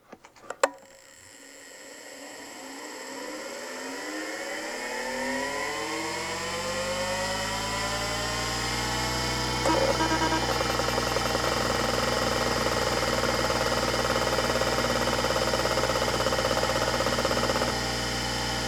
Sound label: Seagate ST-251-1